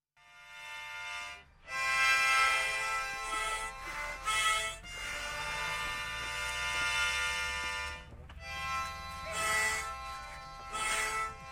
Harmonica Music

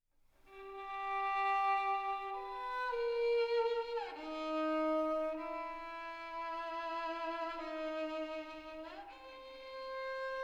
Chorale

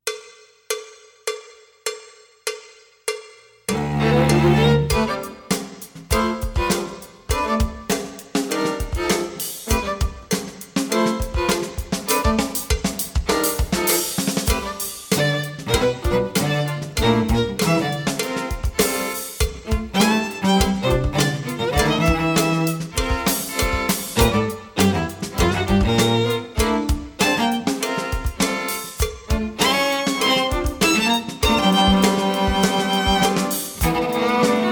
String Quartet

